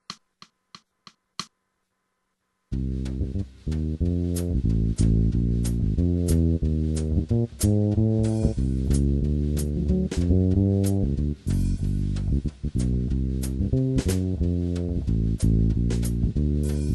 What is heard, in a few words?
Backing, jazz